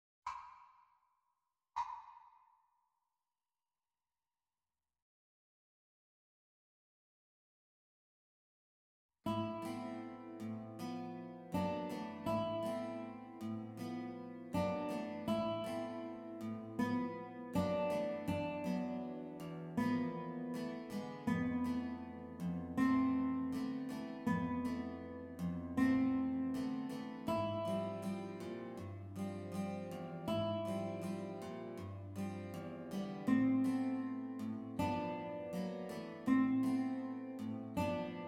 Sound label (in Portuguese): adagio